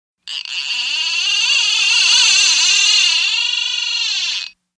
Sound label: Fish Reel Shrt Bursts, fishing